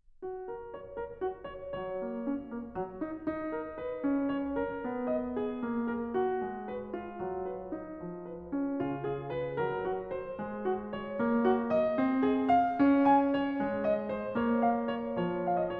Well-Tempered Clavier, Prelude No. 13 in F-sharp major; Bach; Book 1; BWV 858